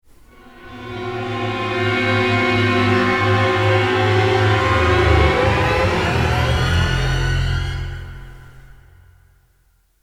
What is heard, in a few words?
Creepy Horror Strings